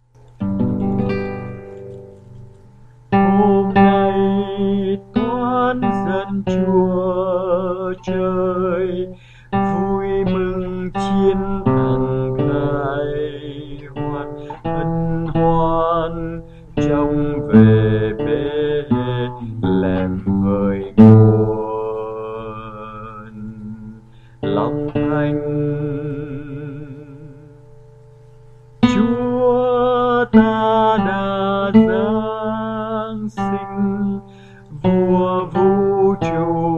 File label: Bass